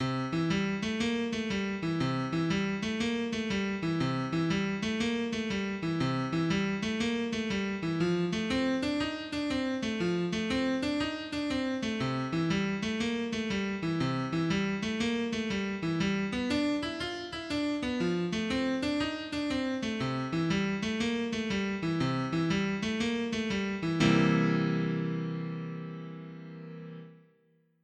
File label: twelve bar boogie woogie blues in c